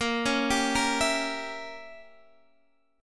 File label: A sharp Diminished 7th, chord